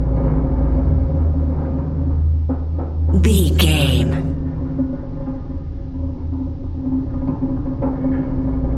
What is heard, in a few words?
metal low creaking ship structure loop